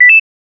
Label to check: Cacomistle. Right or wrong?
Wrong